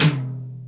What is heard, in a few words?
Toms